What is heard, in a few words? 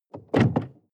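sports car interior trunk open hard; verkehr transport; autos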